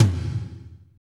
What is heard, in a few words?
TOM, Drumscapes Roland